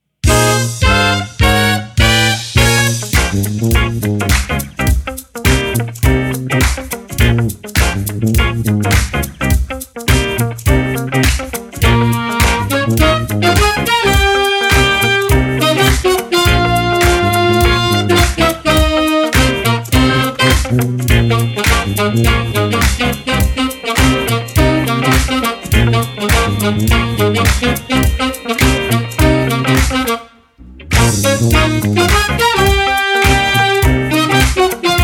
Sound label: groove